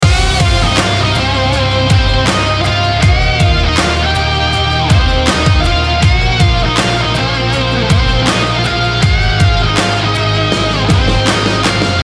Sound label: American Bar Loop